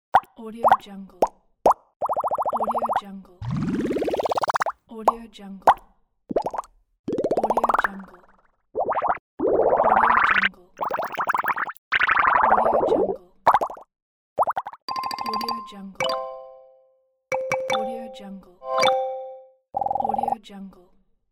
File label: bubbles